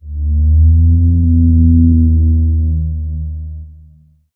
organic moan

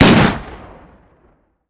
groza shot

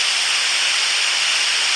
steam, underground

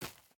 step grass

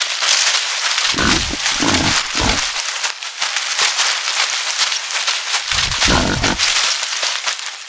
boar swamp run; monsters

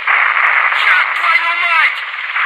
damage, helicopter

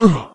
hurt

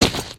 gravel, human step

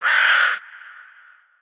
crow, outdoor ambient

